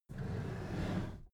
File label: packed drawer smooth clatter open soft halfway